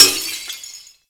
Glass Impact; Warframe

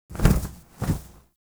Owl Finisher Cycle; Warframe; Duviri; Animals

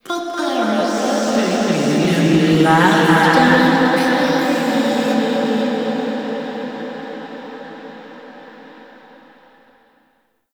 Golden Maw Queen Cave Echoes Two; Warframe; The War Within

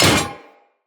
Melee Hammer Impact Generic

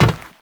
step metal, footsteps; Warframe; Teshin

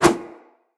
Flip Whoosh; Characters

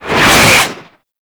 Tank Cannon Projectile Whiz; Enemies; Weapons; Lasrian Tank; Warframe

